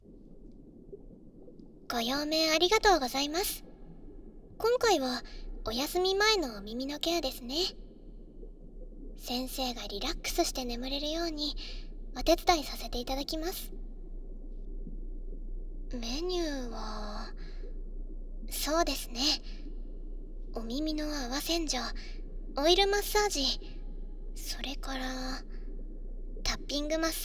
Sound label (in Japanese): 耳かきでぐっすり安眠